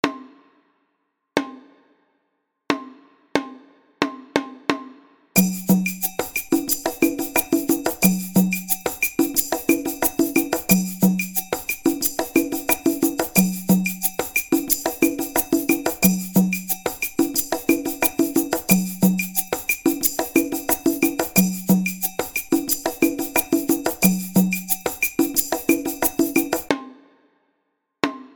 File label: Percussion Loop 90bpm